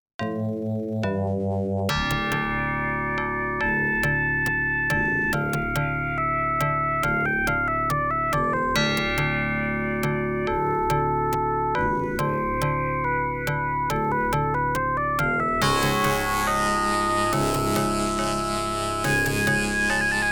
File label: OPL2; Desert